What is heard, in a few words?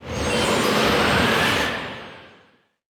effect blizzard; feheroes